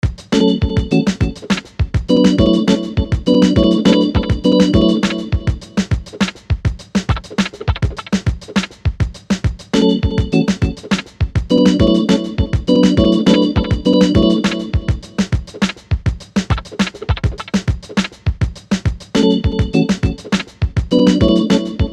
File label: BEAT HIP POP, Beat Hip Pop Organ Delay; Miscellaneous Loops